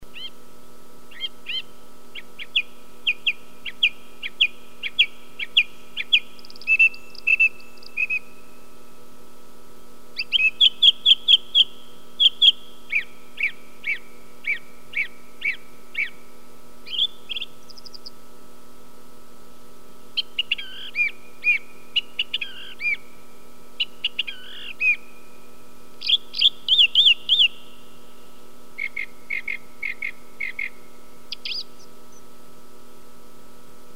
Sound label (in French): grive musicienne, oiseaux